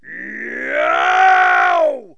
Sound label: Yow!, Cartoon Yells